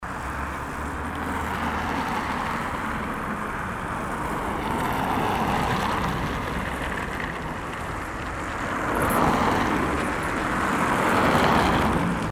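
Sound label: sound of road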